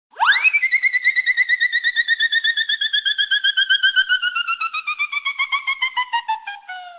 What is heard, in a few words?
whirl sound effect; looney tunes